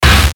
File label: Hit Static Impact; Blastwave